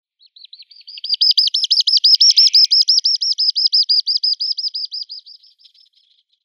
Zwergstrandlaeufer Calidris minuta; Vogelstimmen

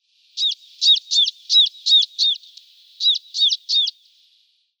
Lapplandmeise Parus cinctus

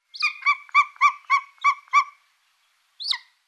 Steinadler Aquila chrysaetos Rufe; Vogelstimmen

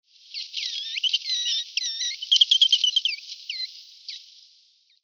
Akaziendrossling Turdoides fulvus; Vogelstimmen

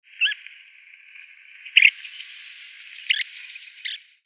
Langzehen-Strandlaeufer Calidris subminuta; Vogelstimmen

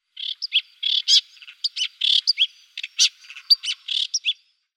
Rotkopfwuerger Lanius senator

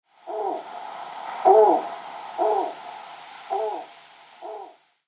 Schnee-Eule Nyctea scandiaca; Vogelstimmen

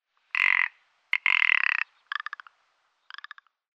Alpenschneehuhn Lagopus mutus; Vogelstimmen

